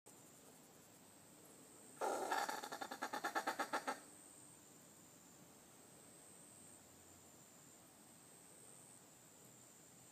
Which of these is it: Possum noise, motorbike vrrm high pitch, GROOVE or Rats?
Possum noise